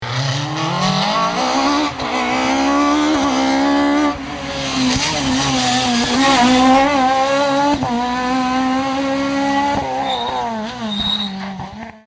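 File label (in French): 306 maxi, rallyes; 306 Vaison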